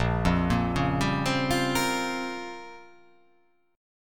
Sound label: A sharp Minor 6th Add 9th A sharp down, chord